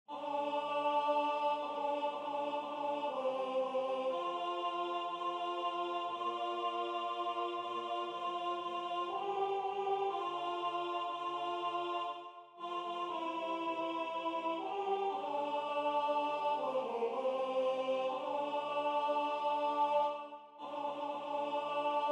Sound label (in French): alto